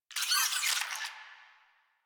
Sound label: Space Drone Pain; Warframe; Corpus Space Drone